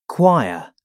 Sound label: choir